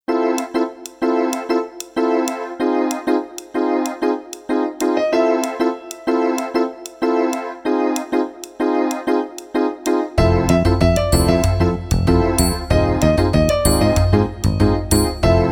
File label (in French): VERSION KARAOKE